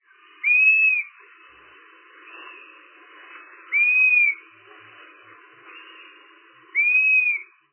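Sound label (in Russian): protyazhnyi svist zazyvayushchii sorodichei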